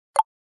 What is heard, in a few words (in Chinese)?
按钮点击声（确认按钮、X按钮）